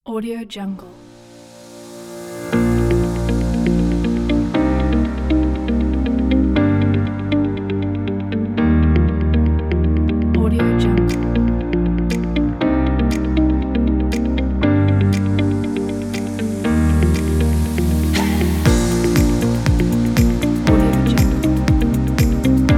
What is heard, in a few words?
motivational corporate uplifting; badgertune